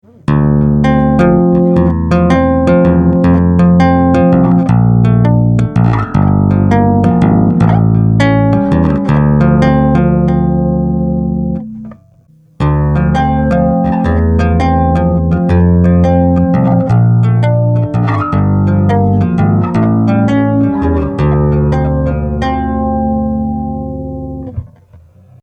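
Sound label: Sweeper Tapping, guitar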